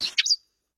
Obstacle Bird Start; In Obstacle Bird Start

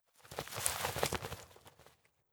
Clothes; Colosse Mumie

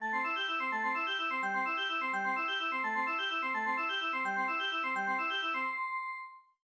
Station Jingles; Botanist

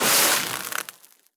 Laser beam damage; Likho

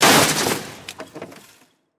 Destroy Death Imp

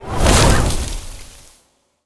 Nature Spell Vines Whoosh; Legend of Keepers

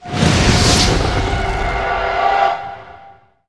Staticnova Target

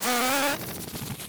Bee Soldier Roar And Curl

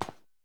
Footstep Wires

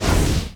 Wand Horizontal Dash Dash

